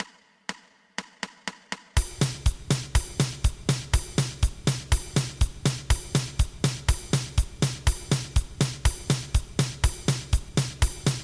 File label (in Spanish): 2 pulsos